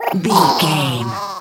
goblin fairy laugh deep

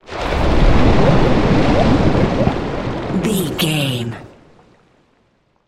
water spell tidal wave drown

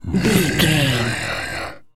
zombie voice groan

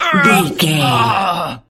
voice male death

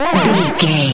retro teleport warp effect

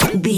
weapon fun small zapper

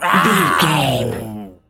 tas devil cartoon

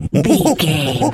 monkey chatter

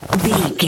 foley object push pull move

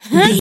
voice female effort jump action

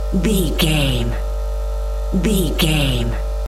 hum motor elec neon loop

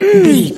voice female effort quick action

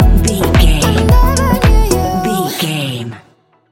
A fast paced piece of club music; A fast paced piece of club music perfect for the nightclub, and a youth orientated visual scene.; stinger

